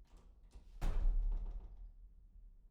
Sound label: door slam; IEEE AASP